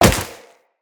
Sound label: Wall Jump Snow, Footsteps; Wall Run; Characters; Tenno